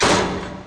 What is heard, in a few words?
door stop